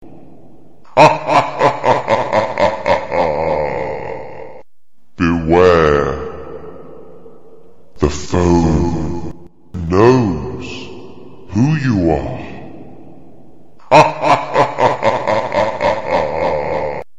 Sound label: Scary